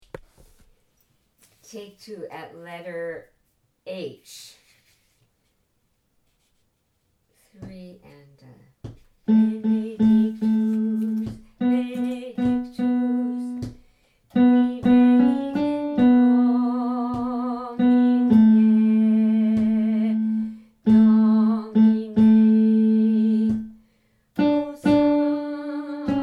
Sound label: tenor; mofc sanctus